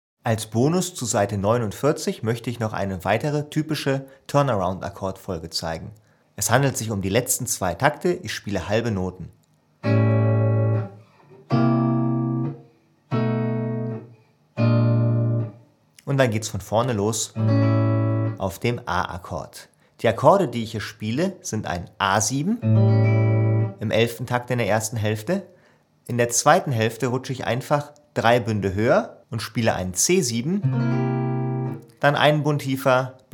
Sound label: Blues Turnaround E, Bluesgitarre